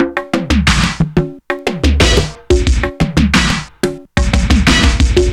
conga rues, 90bpm

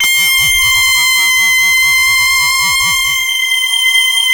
LEADS; Masterbits; Vintage Voltage

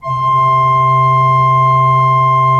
SWL C2, Cathedral Organ, SWELL WERK; Propeller Island

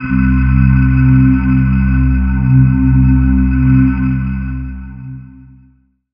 pad, Dance Synths

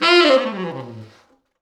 T Sax falls, Horns Sax

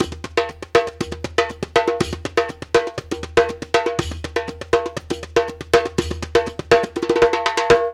120 DUM, Dumbek Belly; Spectrasonics; Supreme Beats; African Contemporary

